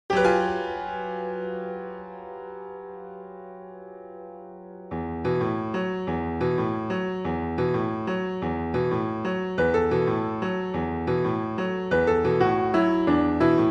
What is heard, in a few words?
miniature in jazz, piano; pieces pour piano